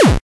Hit Hurt; Blind Drive

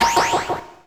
Pickup Magic; SFX Kit; Pickups

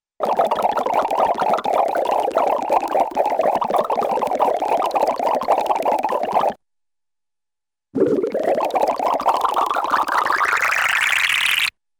Rapid Funny Bubbling Sound, cartoon; Hollywood Edge; Cartoon Trax

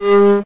timeout; AudioSpeed

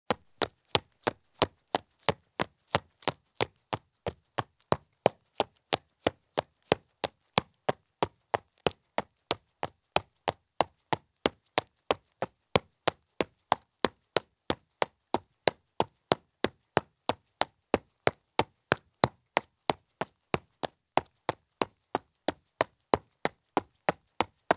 Heavy Running Shoes Jog at Fast Pace on Concrete; Sound Ideas; Footstep Sound Effects

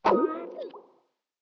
CALL ERROR; skype

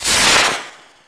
Rocket Launcher Shot; SFX Kit; Weapons

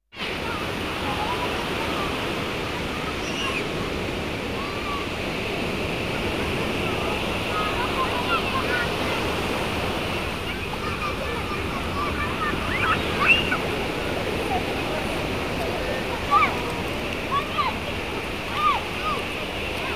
Beach With Children; KMP Film Video Sound Effects

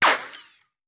Bullet Ricochet; Sound Ideas; Guns

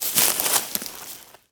Bodyfall Weeds; SFX Kit; Bodyfalls

